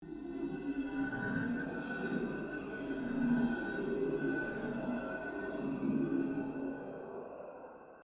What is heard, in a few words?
bendy